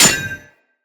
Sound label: Melee Axe Impact Generic